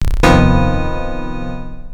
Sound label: shock; Urotsuki Doji; X68000